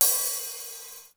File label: open hat; sr16